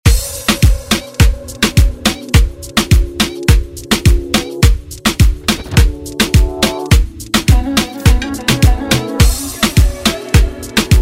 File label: Afro Moombah Redrum